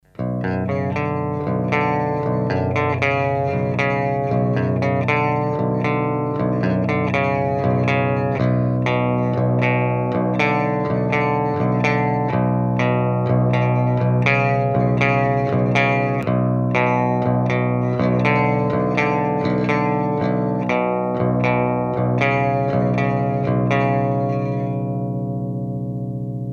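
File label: Eclipse LTD clean, 7string; Eclipse LTD czysty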